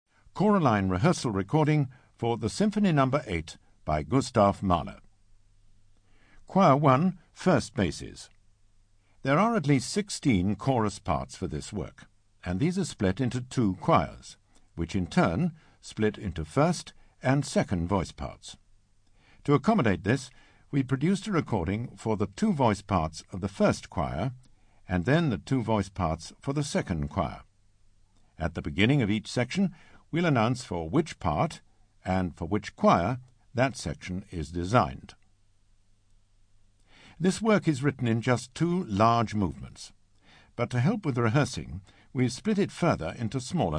Bass1; Mahler No8

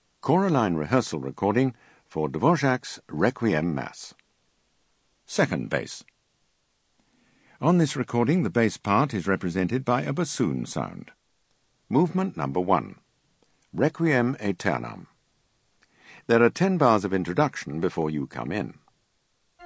Bass 2; Dvorak Requiem

